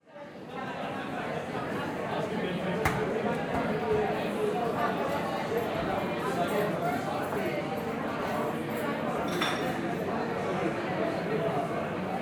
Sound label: AMBIENT - Busy Large Restaurant Interior; Timothy McHugh; Cafe Ambiances